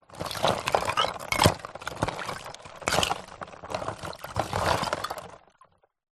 nature ice pieces movement break water plastic tub